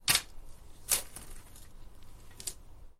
cavar

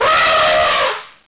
elefante, animales

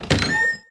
abrir madera, puertas; ruidos

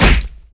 pelea

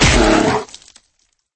caida

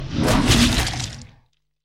zarpazo acierto

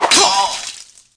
apunyalar

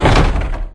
cerrar madera, puertas; ruidos

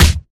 pelea

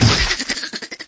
caida

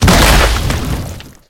caida

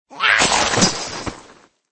caida

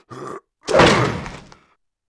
ogro

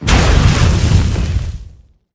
columna de fuego; elemental

